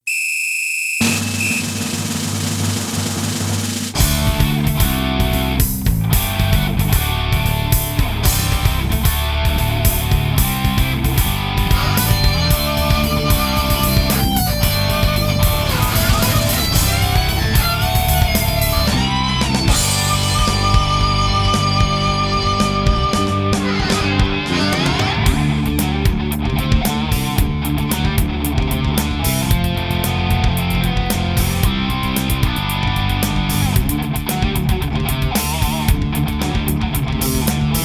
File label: electric guitars